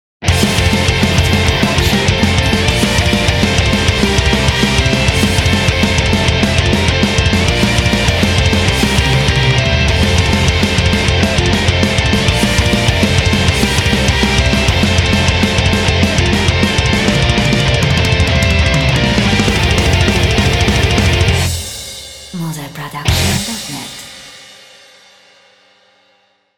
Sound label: Heavy Jungle Bells